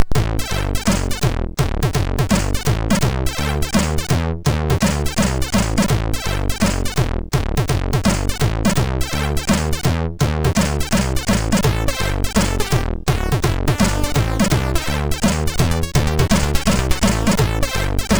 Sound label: Piano Rap, MOS6581R3; sid